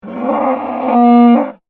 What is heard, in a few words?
Dinosaur Groan, Creature; Animal; Dinosaurs; Animals; BlastWave